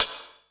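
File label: closed hi hat, 150 BPM; Ueberschall; Drum & Bass Resonance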